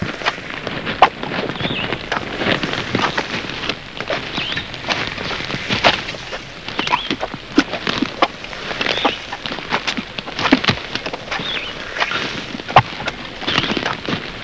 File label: FARM; Klondike Gold - An Interactive History